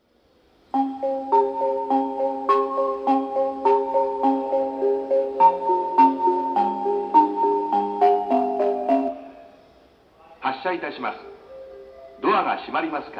akabane melody; jreast; utsunomiya

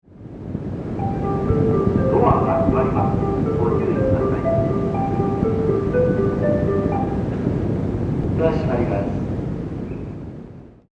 tamachi melody; 3番線（山手外）発車メロディ; jreast; yamanote

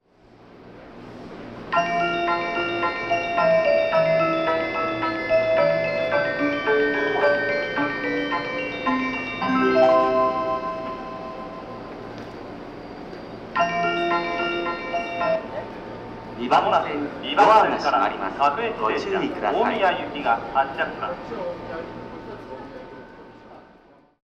melody

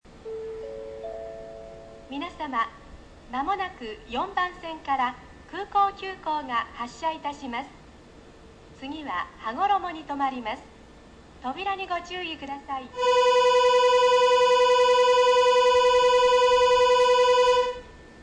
bell; 4番線発車放送＋出発時機合図; 泉大津; 泉大津駅では、空港急行の発車時に限り独自の放送が使われていました。; 空港急行発車時; 南海

